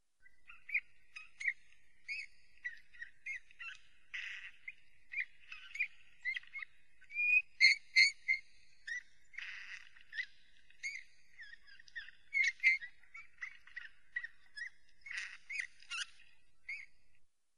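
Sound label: Eurasian bullfinch voice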